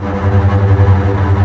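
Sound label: TREMOLO STRINGS G1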